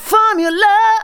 vox, Techno Trance; Ueberschall

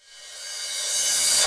Akai XR-20, Drum Machines, Cymbals

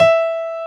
Piano E5; Best Service Gigapack 1

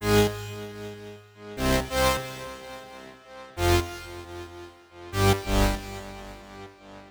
135 Synth; Zero-G Trance Inducer; 90 s Sample CDs

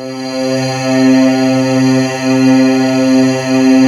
MIRROR DOOR, SY series; Rubber Chicken Software Sample Bank

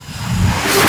sfx sci fi; Masterbits - Switch FX

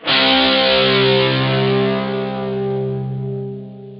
GUITAR, POWER GT

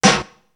great, snares; Zero-G - Funky Elements

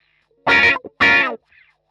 125 wah Bb, wahwah; PowerFX - Nu Jazz House

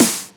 Snares; Vengeance - Essential Clubsounds Vol.4

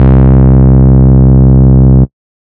SY SMOOTH; ModelCycles

